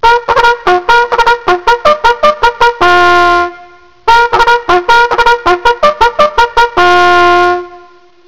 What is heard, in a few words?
mail call, bugle sounds